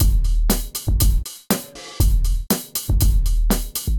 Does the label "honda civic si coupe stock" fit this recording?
No